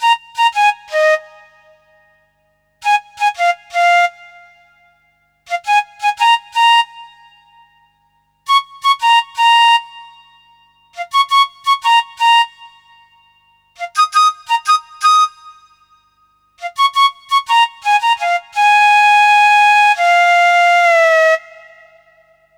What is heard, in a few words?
Flute